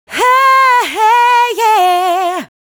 "He hey eah", vocal phrases; Ultimate Vocals

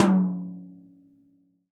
TD-20 Tom A, Roland TD-20 Toms

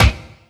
bass drum -L, 168 BPM Drum & Bass; Ueberschall; Drum & Bass Resonance

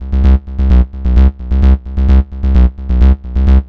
NRG Bass, Trance

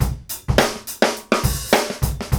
GROOVE

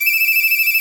BON DEE C4#

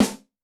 Big Wd Rim, Yamaha DTXpress-II snare

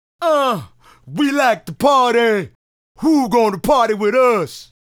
Male Solo, Hip-Hop and RnB Vocals

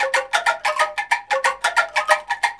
ANGKLUNGS; Spectrasonics - Heart of Asia CD2

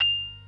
PERC A#4; B3 FULL

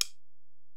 Ac Perc, Drum Machines Alesis DM-PRO

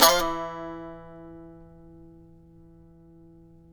saz; Roland - LA Composer vol. 3

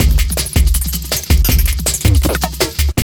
JUNGL 160, JUNGLE LOOP

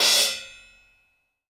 DW Green Maple Cymbal Grab; Sonic Foundry (Sony Creative Software); Vital Drums

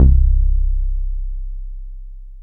BSE C, BASSES, House

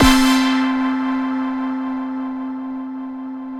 JV-1080

